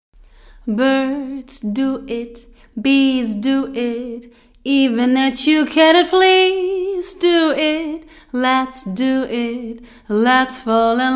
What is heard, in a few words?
Chamber